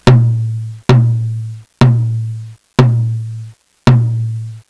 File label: tomtom